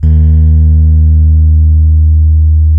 ROADZ D#1, Suitcase E.P; E-MU Producer Series Vol. 2 – More Studio Essentials; Keyboards

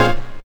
BRASS HIT

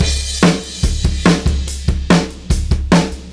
Beat Loops; Zero-G; Monster Beats

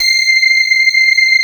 STRIA, Twisted Textures; STRYNGI

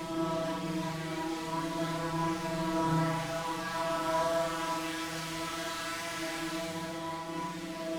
Drone; stereo toolkit samples; Non Tempo Loops

